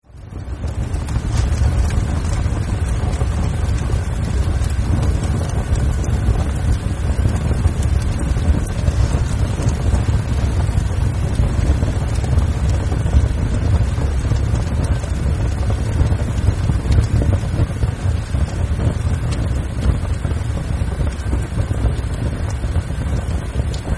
Fireplace medium, Medium Fire Burning Paper Inside Fire Place-Closed Miked; Household; Fireplaces